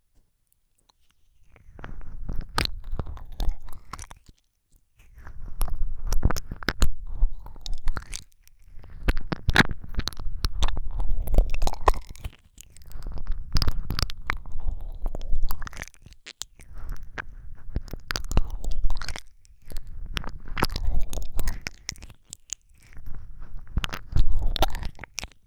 お耳を舐めあげる耳舐め; 癒やしの耳舐めワールド4

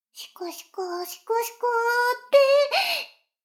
シコシコ音声; 逆時間停止されて

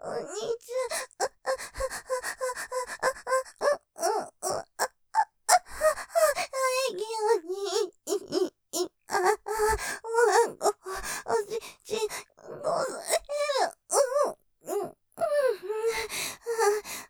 喘ぎ◆気持ちいい、おまんこ、こすれる; ステレオ

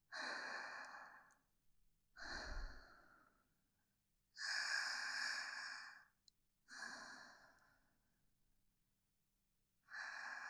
寝息; 名月; スキ×キス; 左右反転

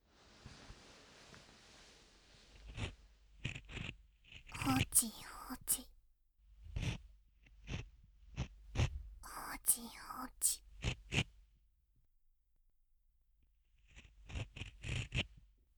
左耳かき, ASMR

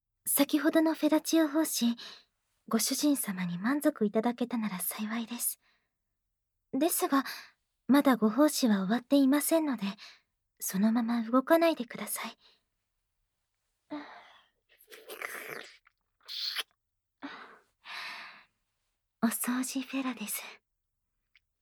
お掃除フェラ・耳舐め; 舐めフェチ覚醒と処女を捧げるご奉仕【お掃除フェラ・耳舐め手コキ・処女を捧げたご奉仕正常位】

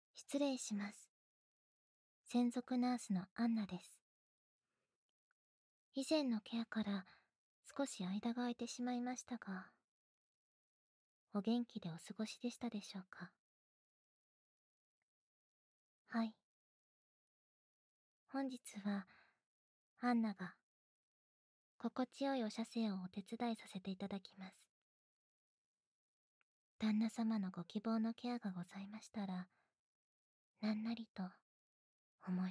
耳舐め手コキケア（左右反転）; ナースあんな; 癒し系専属ナースのゆいちゃんと菜々子ママに甘々&バブみで包まれちゃうバイノーラル

